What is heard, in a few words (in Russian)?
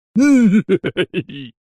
smeh multyashnyi